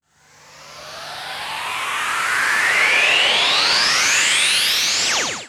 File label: Uplifter